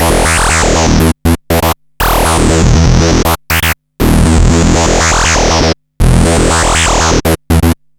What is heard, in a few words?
Analoop, Industrial Analog